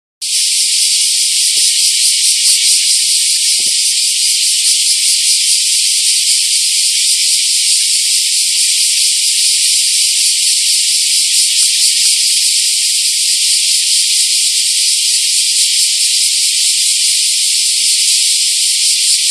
kumazemi

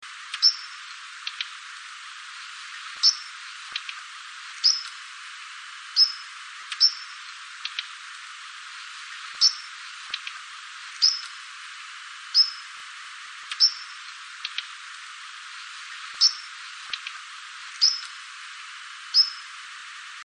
ジョウビタキ

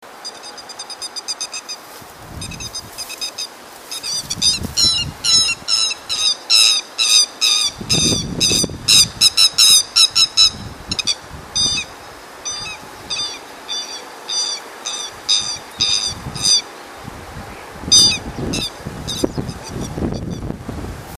keri, birds

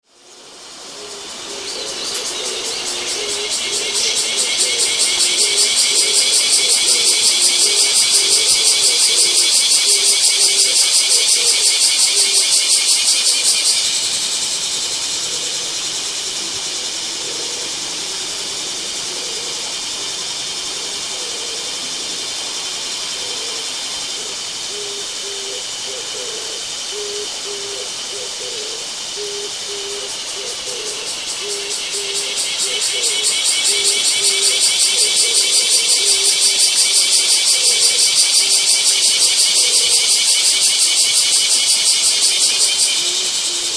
kumazemi, Insects

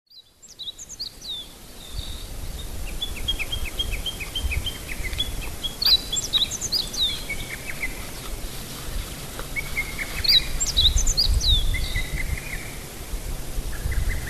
hutte hojiro

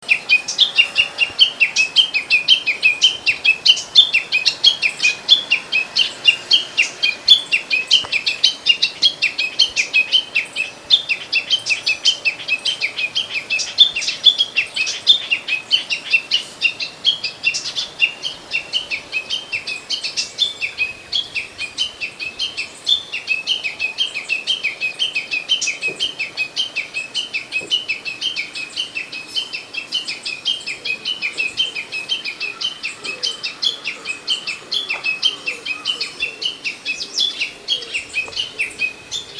uguisu, birds

